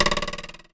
Twang; Crab

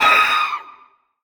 Raptor alerted; ice age scrats nutty adventure

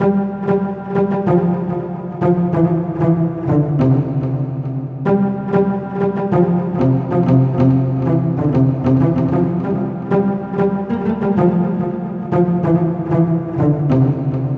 Bass

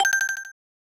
Selection Jingle

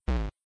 bump

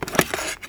Arrow Spawn; Timelock VR

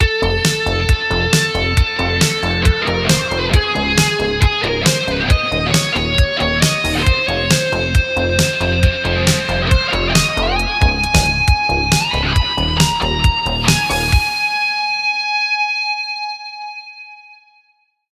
Stinger; Salty Shores

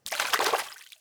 splashes; Undertaker's 2017 gamerip PC

